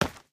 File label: stone, step; STALKER; minecraft